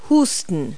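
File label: cough; verb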